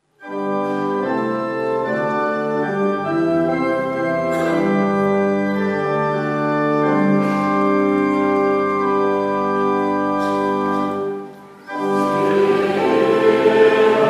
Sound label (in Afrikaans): Gemeentesang